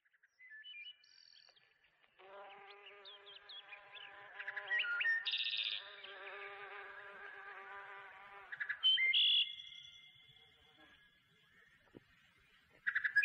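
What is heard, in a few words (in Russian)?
Пение птиц звуки природы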